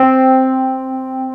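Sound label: Wurlitzer C4 - L; USB Soundscan vol.09; AKAI; Keyboards Old School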